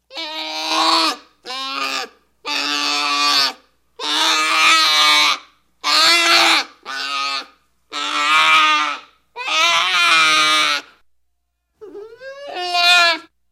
ours noir d Amerique cri, cris d animaux

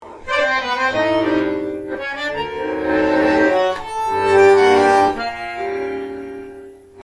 bandoneon son

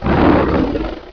tigre cri

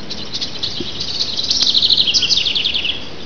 troglodyte cri

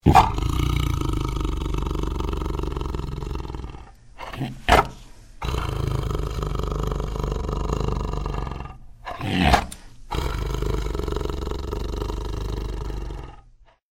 tigre de Siberie cri

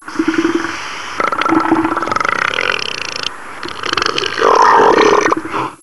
elephant de mer cri

